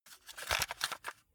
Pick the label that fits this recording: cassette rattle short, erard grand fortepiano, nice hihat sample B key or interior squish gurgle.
cassette rattle short